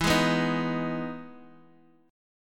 E Major 7th E down Guitar Open D

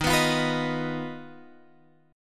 Eb Suspended 2nd Sharp 5th, Guitar, Drop D

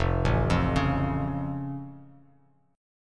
F Augmented, Bass Standard; arpeggiated

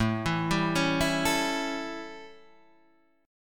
A 7th A, Guitar Drop D; 7,7,5,6,5,5

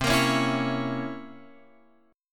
C 7th Flat 9th C Guitar Open F; C7b9 strummed

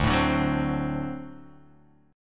D 7th D down

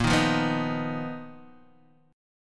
B Major 7th Flat 5th Bb, chord shape

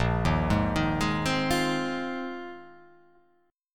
A sharp 7th Suspended 2nd Suspended 4th

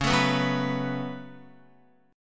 Db 7th Suspended 4th Sharp 5th Db down Guitar; JGuitar

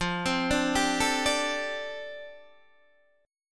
F 6th Add 9th F down Guitar Open D, chord

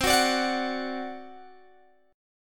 C Suspended 2nd Flat 5th, Guitar, Open G; down; chord shape

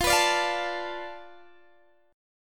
F 6th Flat 5th F down Guitar DADGAD, chord

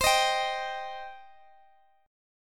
C Minor C down Guitar Open G

